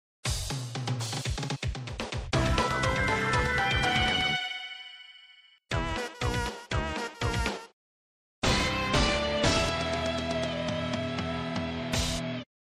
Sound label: jingles; Wario Deluxe; WarioWare Gold